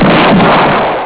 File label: boom